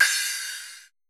cymbal, Roland MC-909

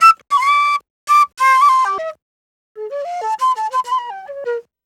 Penny 98 bpm G, Penny Whistle, African; Quazibeat; African Secret

